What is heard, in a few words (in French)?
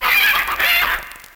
Cri